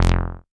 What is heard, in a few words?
bass F1, Moog Minimoog